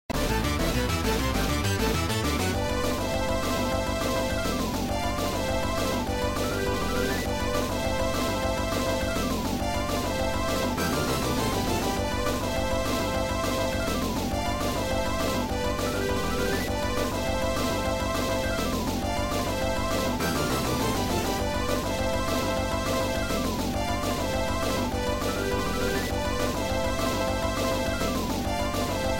Clear All Theme; Imitation; Crime Fighters; arcade

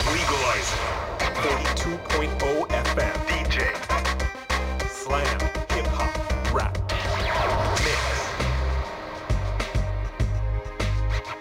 Radio Hiphop Jingle; Gangstar Miami Vindication; android; macos

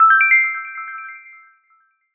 New Stage Unlocked

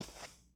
Foot Carpet Shoes Walk; Lucius III

